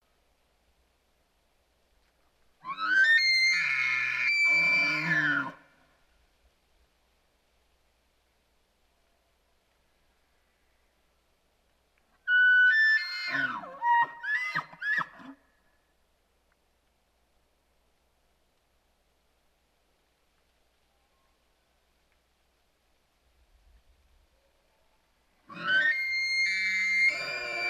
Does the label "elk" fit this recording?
Yes